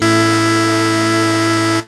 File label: chord 1, sound chord